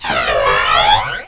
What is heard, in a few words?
med scan; ambience; poke646